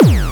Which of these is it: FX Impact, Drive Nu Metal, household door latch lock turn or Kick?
Kick